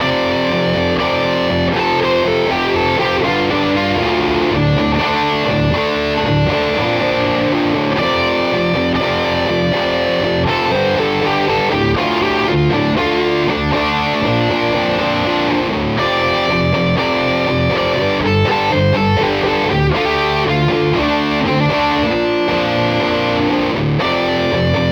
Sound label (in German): Source Audio EQ 1 ohne